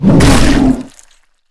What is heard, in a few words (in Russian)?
ataka razyarennogo zverya